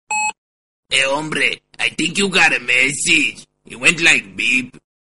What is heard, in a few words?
mexican message ringtone